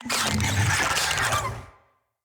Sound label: Infested Big Ashen Vocal Attack Death; Enemies